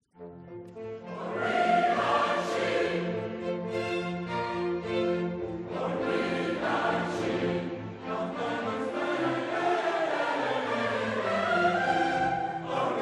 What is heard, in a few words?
CHORUS All we like sheep have gone astray; Messie Handel